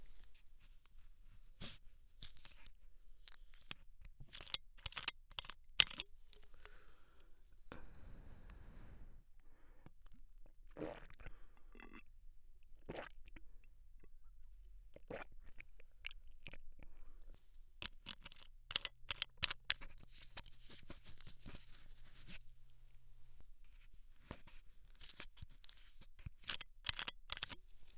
飲み込む 右; ボーイッシュなラバースーツ彼女に赤ちゃんにされて再スタートする人生

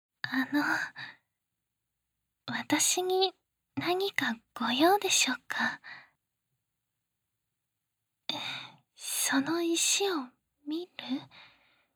リアル耳舐め; SEなし; ２回目の願い; 従順人妻 ─催眠宝石で強制洗脳─

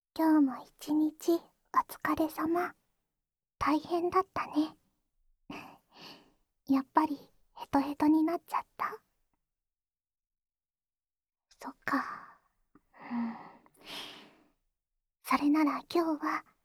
オナサポ用フェラチオループ; ダンジョン最奥の禁断の魔女は、あなたのおち○ちんを離さない!

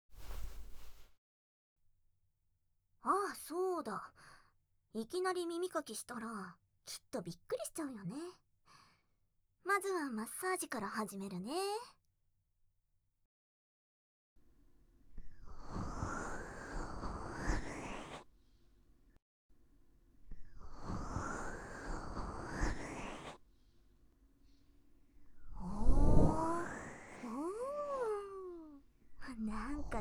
ループ用音源, ASMR

